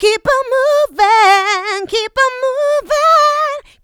Female Vocals; Houseworx

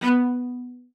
B3, CELLO SPICC; Xsample VOL-5

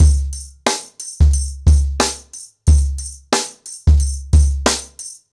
Nuclear 90BPM, ACOUSTIC DRUMS

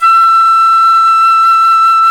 PICC; Roland - LA Composer vol. 1; WOODWINDS

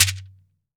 MARACA TONE; AKAI S-Series CD-ROM Sound Library VOL-5; SHAKER.MARAC

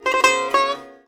SITAR GRV, World Colours; Best Service

